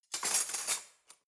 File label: cutlery knives drawer place